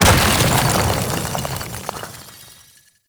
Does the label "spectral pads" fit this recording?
No